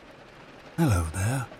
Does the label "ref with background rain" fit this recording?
Yes